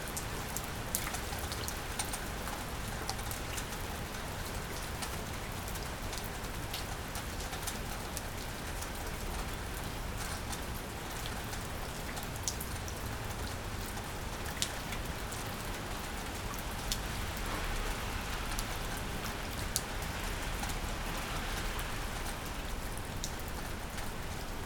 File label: shelter from rain